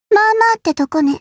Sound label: yay